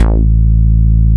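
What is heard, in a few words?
Rubber Bass, a1; BGM; Nintendo; July 2020 Gigaleak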